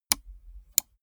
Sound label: gaming mouse left click single slow; maschinen; buero